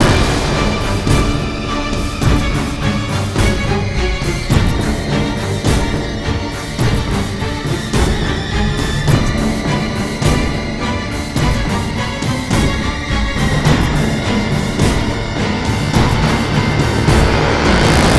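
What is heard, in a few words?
music, fast loop; transformers war for cybertron gamerip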